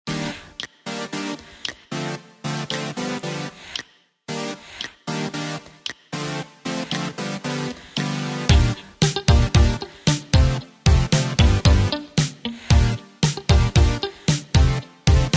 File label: urban